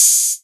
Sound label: 808 Hat O; Drums